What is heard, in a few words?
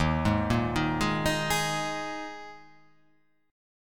Eb Suspended 4th Eb, Ebsus4 chord; Ebsus4 arpeggiated; JGuitar